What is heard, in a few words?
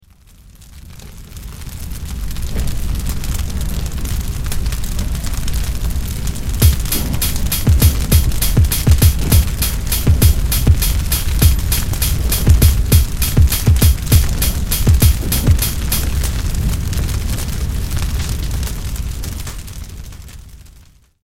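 fire forest inferno with beat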